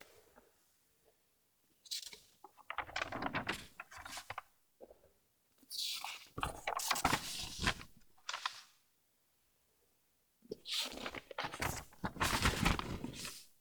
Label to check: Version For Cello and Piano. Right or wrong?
Wrong